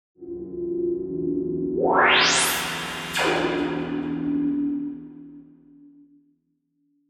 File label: Turn On Science Fiction